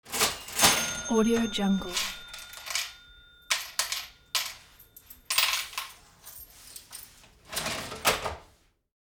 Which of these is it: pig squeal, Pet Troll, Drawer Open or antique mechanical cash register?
antique mechanical cash register